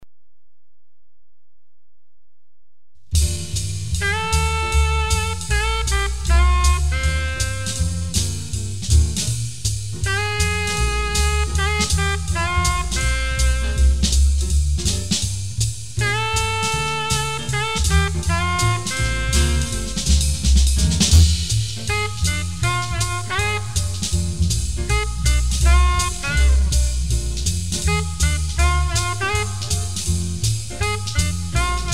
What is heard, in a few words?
Groove Blues